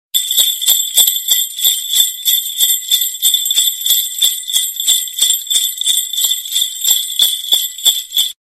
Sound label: The Bells, SMS Tone